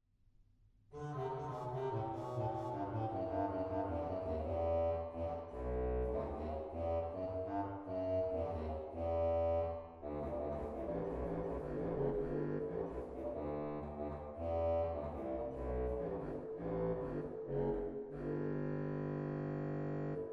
Dulcian 16, organ; stade

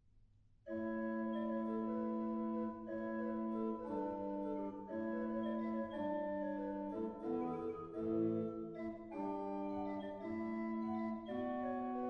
Quintadena 8, organ; stade

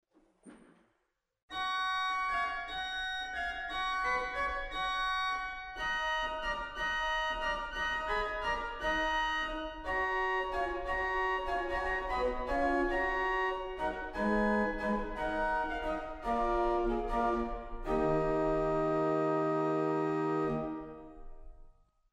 Octava 4; waltershausen

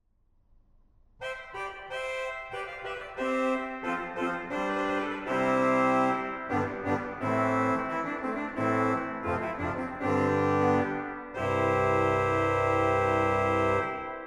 Trompete 8; goenn

